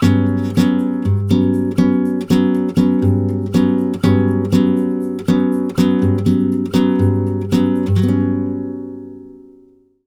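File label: 120 guitar G minor 7, Brazil Bossa; USB Soundscan; AKAI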